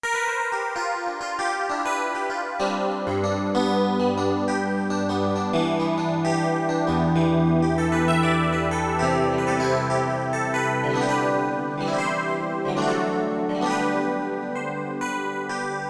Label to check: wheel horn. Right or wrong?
Wrong